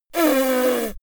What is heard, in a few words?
little girl snort; soundpool